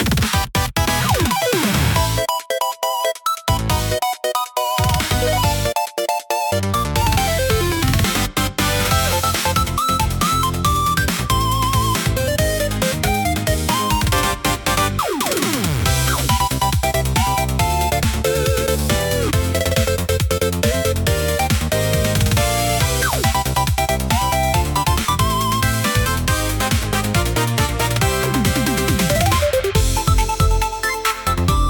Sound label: rpg battle; tillys-quest